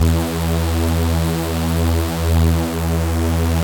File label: Super Saw E2